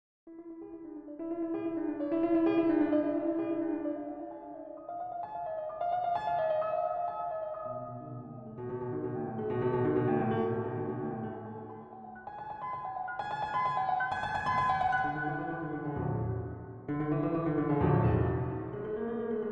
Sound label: Quarter-tone Piano; Quarter-tone Piano Prelude No. 1